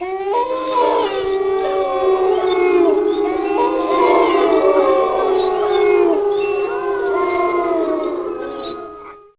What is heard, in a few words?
wolves